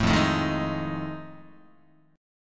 F 7th Sharp 9th Guitar, chord; F7#9 strummed

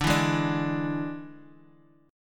D Suspended 2nd Flat 5th D

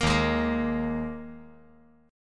Eb Suspended 4th Eb 5G 4C 5E 5A; Ebsus4 strummed; JGuitar

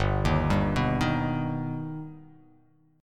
G Augmented G, chord; G+ arpeggiated; JGuitar

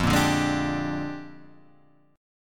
Bb 13th F

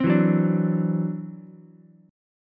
E 7th Suspended 2nd Sharp 5th, E, down, Ukulele; E7sus2#5; strummed

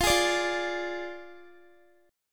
F Minor 6th F down Guitar; strummed; tuning 4E,4A,5D,5G,5C,6F

